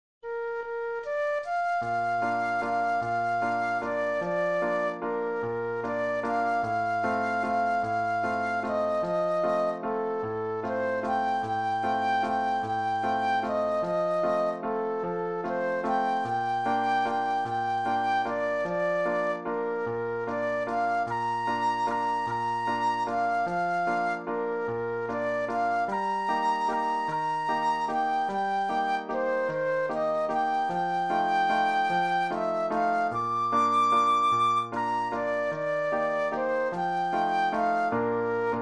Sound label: Flute Solo; classical